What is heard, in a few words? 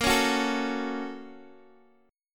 Bb Minor Major 7th Sharp 5th, Guitar, Open D